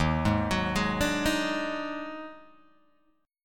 D sharp Minor Major 7th Double Flat 5th D sharp Guitar Open G